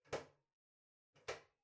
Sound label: Ceiling Light (Far Pull)